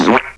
pups feucht; geraeusche menschl

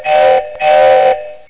spielzeugzug

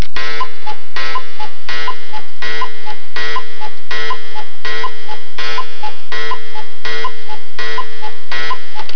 Kuckucksuhr

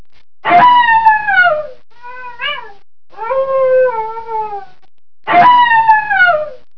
kojote; geraeusche tiere

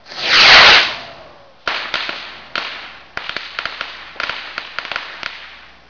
Feuerwerk

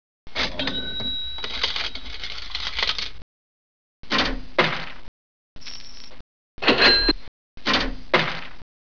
alte kasse

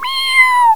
Katzenjunges; geraeusche tiere

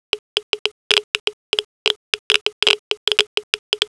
geigerzaehler

